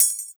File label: PERC L, Drum & Bass 160 BPM; Ueberschall; Drum & Bass Flash Trackz